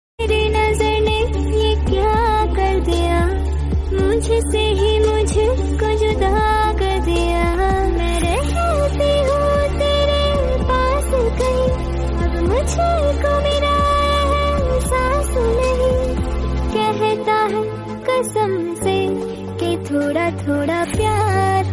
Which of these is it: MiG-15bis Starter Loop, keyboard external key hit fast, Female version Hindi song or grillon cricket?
Female version Hindi song